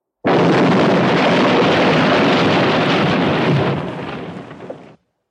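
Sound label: old-fashioned space rocket crashed with debris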